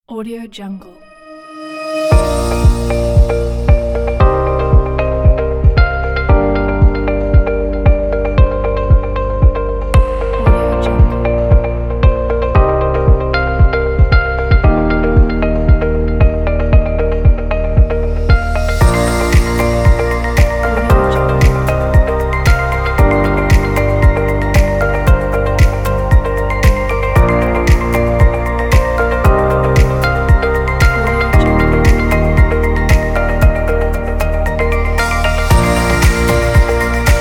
for corporate music pack

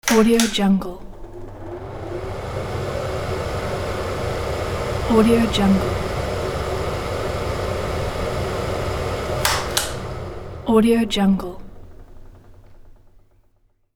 extractor fan

